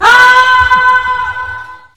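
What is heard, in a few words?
spy pain critical death; voice packs; spy jackson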